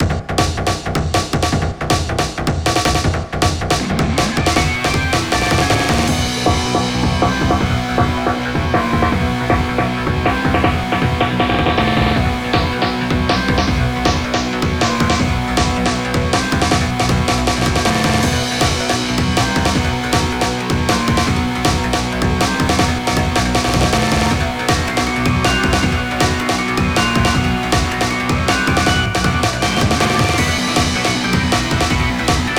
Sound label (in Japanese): マヤカシ Loop; 過去に深い悲しみを背負ったキャラを表現した弦楽四重奏風の戦闘BGMです。